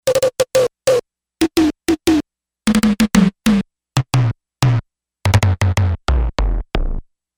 Tom 4

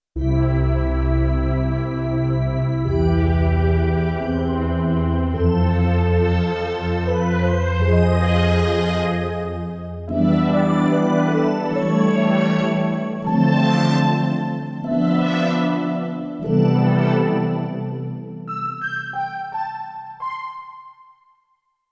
Roland D-70, Factory Performances

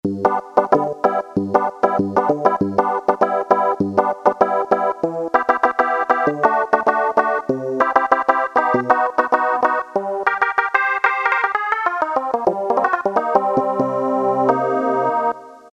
ELEC ORGAN, Casio Rapman; Tones

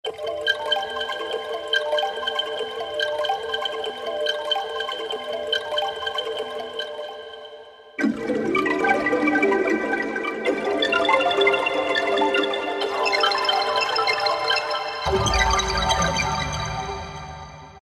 Korg M1 Lore

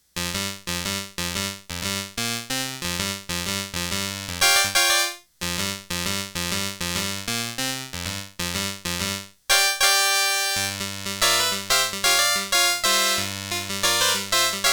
High Clavichord, Multivox MX-20

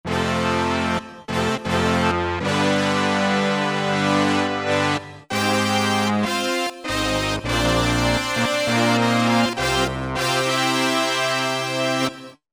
Gated Horns

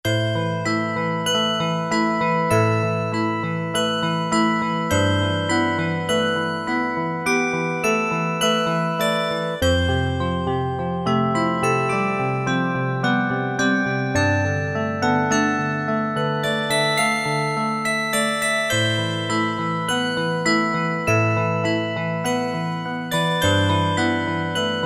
E. PIANO ORGAN; Akai SG01v

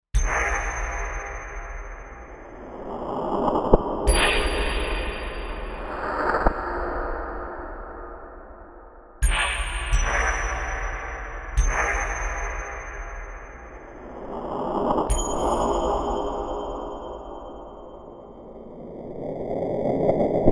Hit

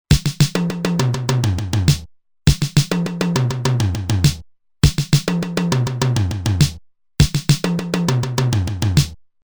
Fill In 4-4, Roland TR-505; Factory Rhythms

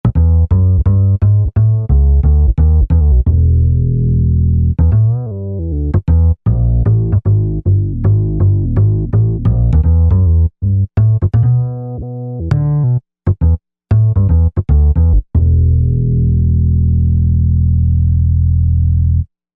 Punch Bass, synth

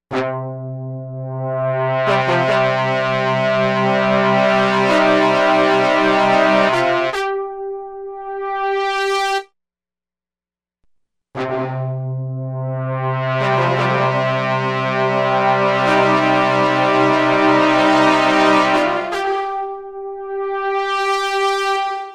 DELAY SMALL HALL

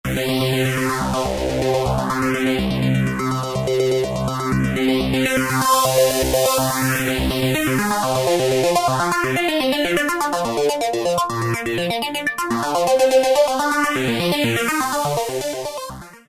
Dirty Motion, Korg microKORG; Factory Voices

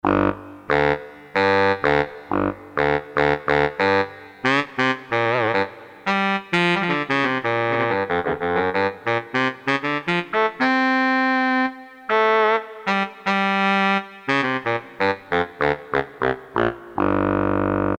BARI SAX, Korg DSS-1

